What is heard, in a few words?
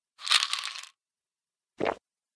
Pills